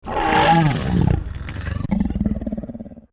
Dinosaur Hiss

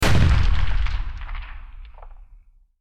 Gun Rifle Shot

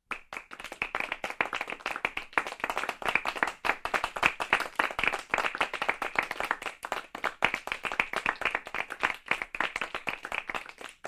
Small Group Applauding With Cheers

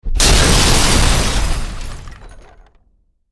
Crash Metal and Wood

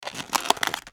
Styrofoam Container

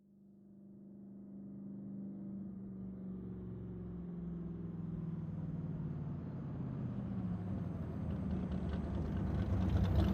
Auto Vintage Sports Pull Up

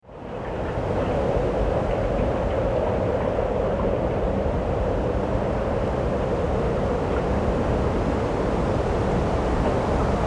Cave and Wind

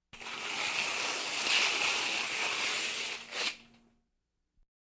Tearing Paper Long

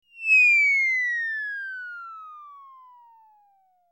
Cartoon Accent

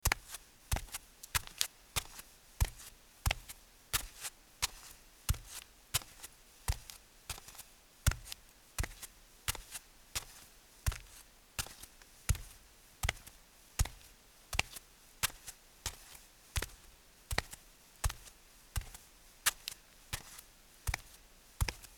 Footsteps Bare Walk

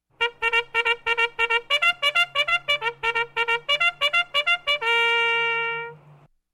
Bugle Call Outdoors Charge

